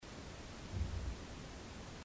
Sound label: Boiler room thump; Erebus